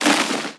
Thunder Rumble; Playable Tutorial Level

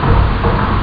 Small Fan On; Playable Tutorial Level; TR2

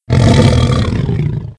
Lion Death; Playable Tutorial Level

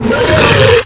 Giant Mutant Attack; Playable Tutorial Level; TR1

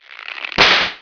Sandbag Snap; Playable Tutorial Level; TR2

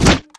Push Big Switch; Playable Tutorial Level

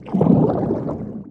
Scuba Diver Arm; Playable Tutorial Level; TR3

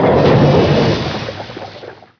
Minisub Clatter; Playable Tutorial Level; TR2

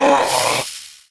Mutant Gassed; Playable Tutorial Level; TR3

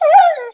Dog Death; Playable Tutorial Level; TR2

